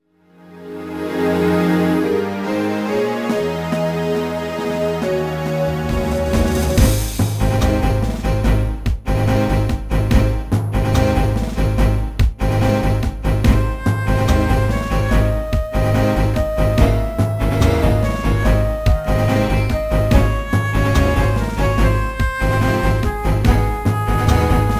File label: BGM Title; Dragon Tamer Sound Spirits